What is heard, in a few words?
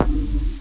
scan; audiotracking